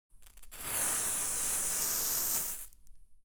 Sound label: ambience room butchery working layer